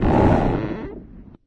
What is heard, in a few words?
strider leg stretch; scmod; synthcrab